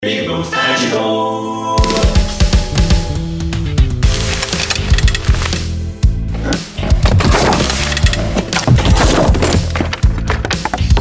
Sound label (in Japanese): 学校椅子アクション; ぴぐみょん効果音素材集; ぴぐみょんスタジオ